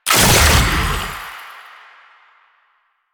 Fuel Projectile Super Pick Up; JadeShadows; Ascension

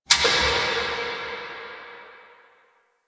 Subway Misc, Ambience; Warframe; Nineteen Ninety Nine

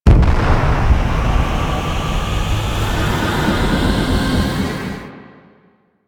Void Dragon Floor Lava Pillar Start Wide; Enemies; Warframe; Duviri

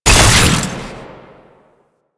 Alt Shot; Weapons; Warframe; Fulmin Prime

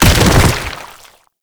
Bodyfall Default; Enemies; Warframe; Proto Infested

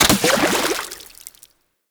Fishing Spear Water Impact; Warframe; Weapons; Eidolon Fishing Spears

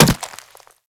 Infested Runner Step Default; Warframe

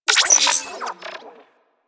Digital Glitch; Ambience

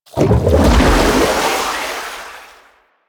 Aqua Board Move Start Boost; Warframe; Yareli

